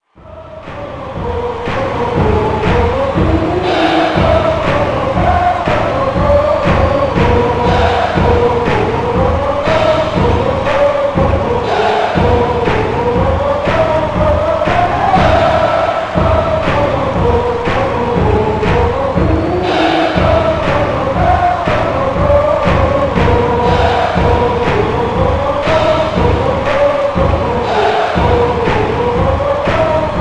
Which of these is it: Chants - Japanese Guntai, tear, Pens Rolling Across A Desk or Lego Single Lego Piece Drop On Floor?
Chants - Japanese Guntai